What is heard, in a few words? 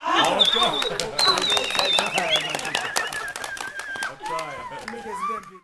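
cheers whistle, African cheers; Spectrasonics; Heart of Africa 1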